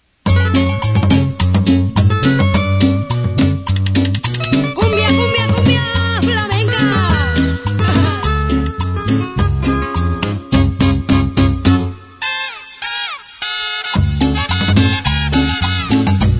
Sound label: Cumbia